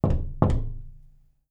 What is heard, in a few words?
knock